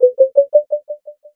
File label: slightly low, sonar; staircase